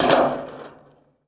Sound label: bleacher land; Golden Crayon Sounds